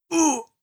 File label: damage grunt male